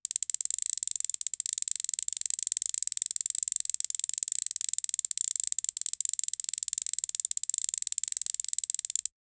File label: foley, sound-effects